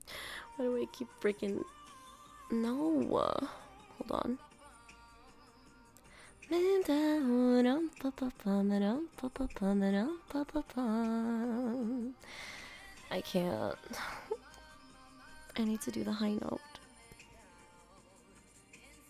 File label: singing blooper